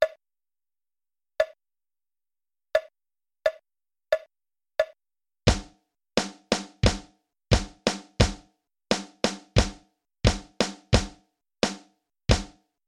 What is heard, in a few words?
Warm-Up, drums